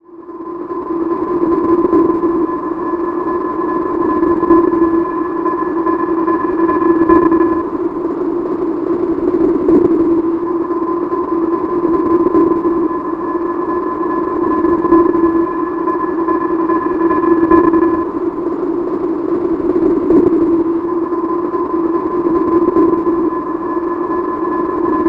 Wind pipe F; Zero-G Seismic Frequencies; 90 s Sample CDs

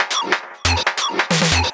drum 138, set-of-signals, drum-loops, Techno; Techno ID

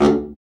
BRA BARI, Bariton Sax

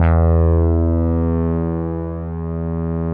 ANA BS, SYNTH BASS

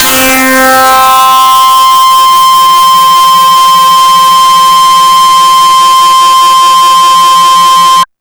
DIST STACK; Big Beat Samples & More; AKAI

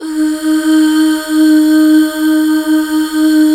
UUHH, Back Uuh Fem

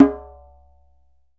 DJEMBE, African Drum; E-MU Producer Series Vol. 7 – Old World Instruments

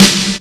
GATE SN, Snare

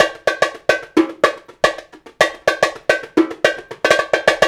108, African Ethnic Drums; Spectrasonics; Supreme Beats

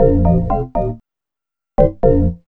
B-LOOP; X-Static Goldmine 1

